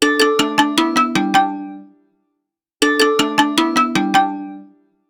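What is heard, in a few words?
Bamboos, ringtones; ZTE Nubia V70 Max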